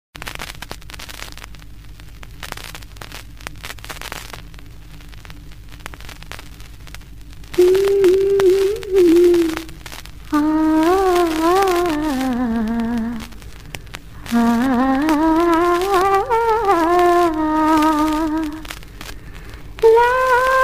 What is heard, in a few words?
Female; Kokkoroko 1984